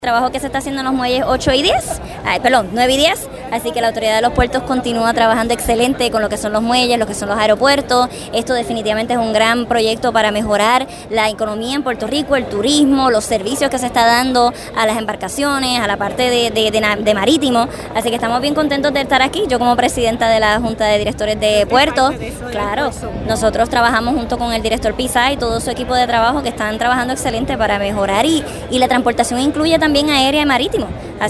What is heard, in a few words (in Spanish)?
SONIDO PUERTOS; DTOP; autoridad de puertos